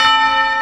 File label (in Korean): 종소리; 少女终末旅行; 소녀 종말 여행 음원팩 (FL)